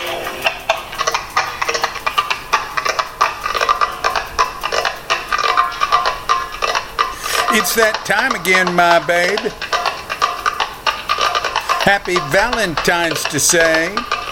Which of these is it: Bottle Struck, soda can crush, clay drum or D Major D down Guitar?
clay drum